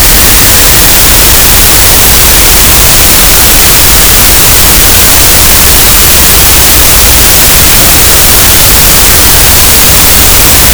cachalot negatif, Physeter Macrocephalus, gaussian noise